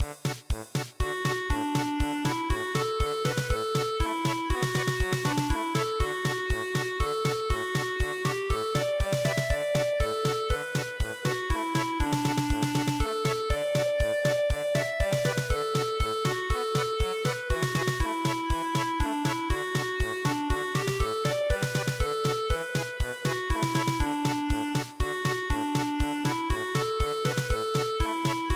German Polka; hazy moon

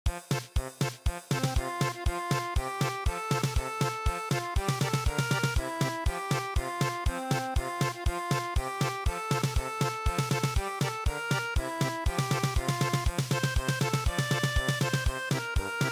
German Polka

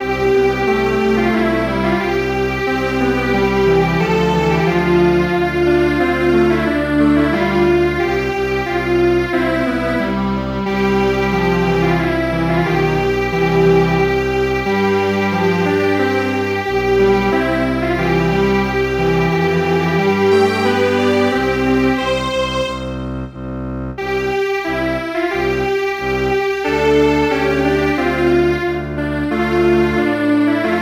Strings Quartet

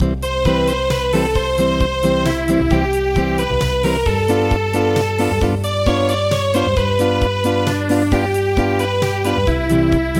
Acoustic, traditional

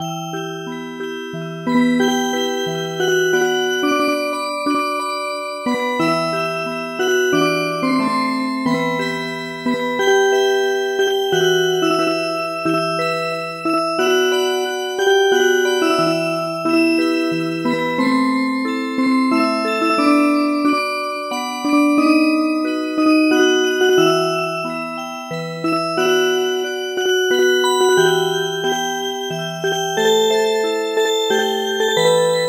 Music Box

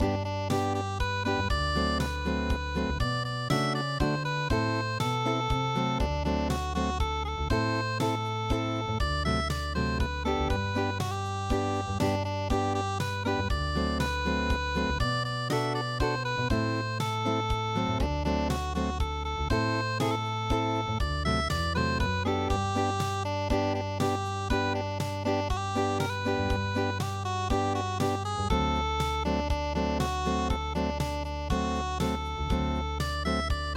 unpluged; La Cucaracha

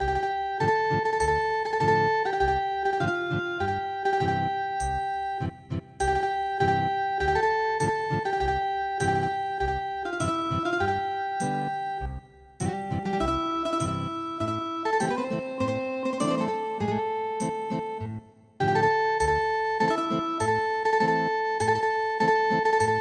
Ethnic Guitar

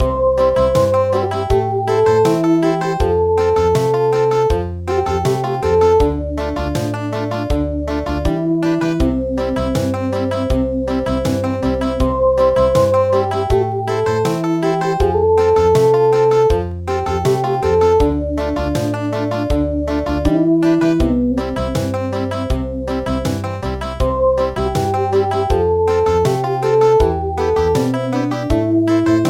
Country

